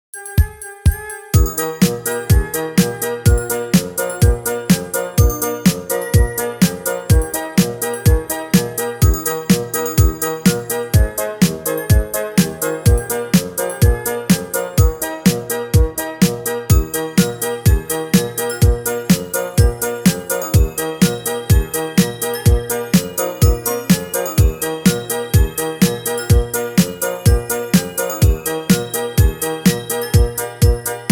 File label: Polka (Orchestral Version)